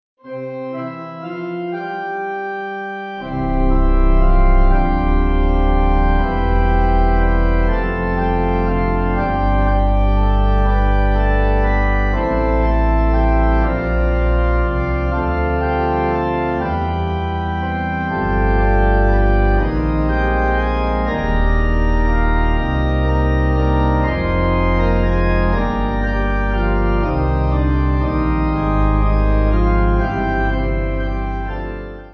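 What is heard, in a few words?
Pipe organ, church music backing; Glory To You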